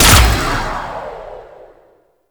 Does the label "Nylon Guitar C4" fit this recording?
No